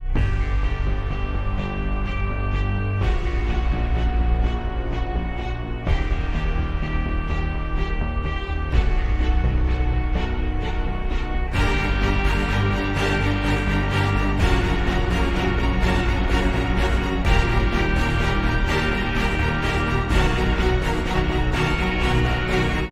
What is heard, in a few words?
Loop Dream